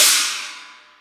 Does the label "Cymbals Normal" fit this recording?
Yes